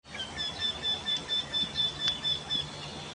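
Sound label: vertisheyka voice, wryneck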